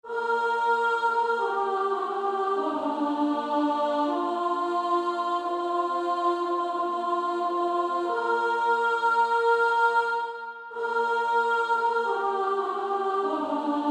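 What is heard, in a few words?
Female Choir, soprano 2; Pass me Not O gentle Savior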